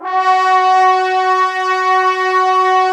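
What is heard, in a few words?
brass trombones F#, Woodwind Brass Ensemble; 90 s Sample CDs; Miroslav Vitous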